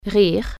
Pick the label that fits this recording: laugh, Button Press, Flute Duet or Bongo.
laugh